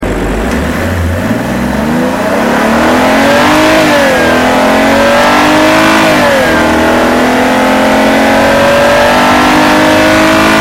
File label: 528i Hard Acceleration Outside